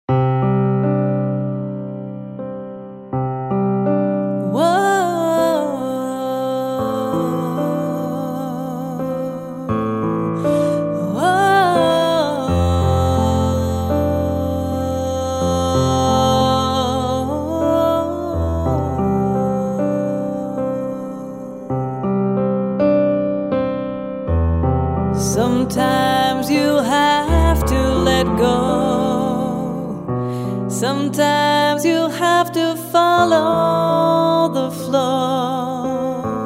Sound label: Piano